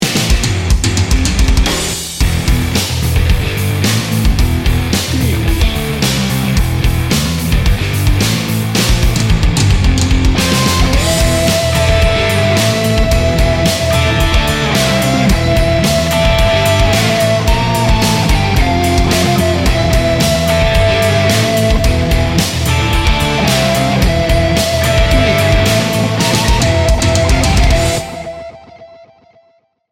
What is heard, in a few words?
hard rock grunge